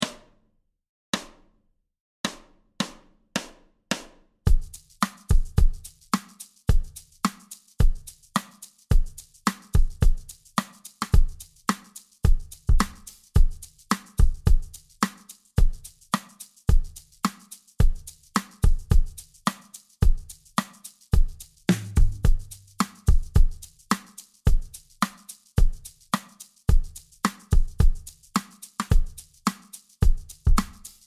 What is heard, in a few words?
RnB 108bpm